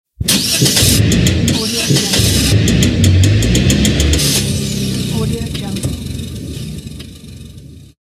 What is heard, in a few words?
broken car engine start